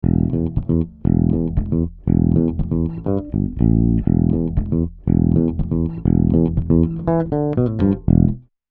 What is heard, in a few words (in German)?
Tubesessor Bass unbearbeitet; Heritage Audio